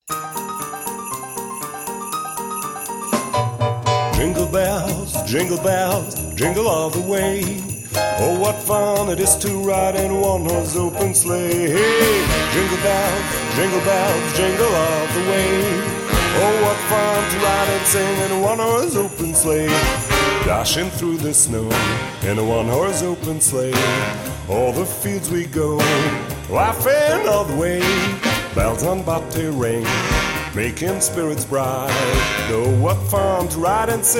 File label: jingle bells